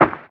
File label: Ground short